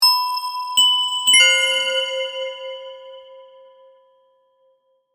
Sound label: Musical Bells, Musical, Bells, Accent, Mysterious, Accent, Transition; Production Element, Imaging Element; Synth; BlastWave